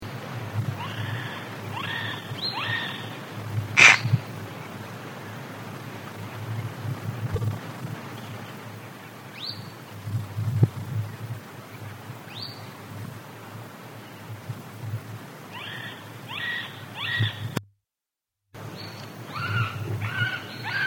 golden green woodpecker

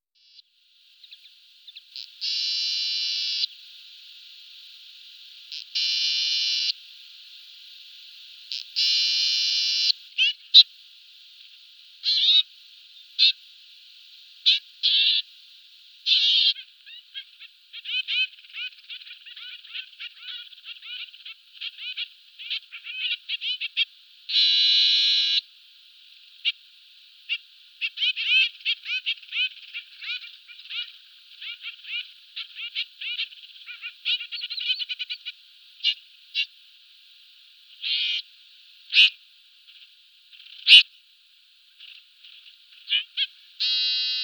trumpeter finch

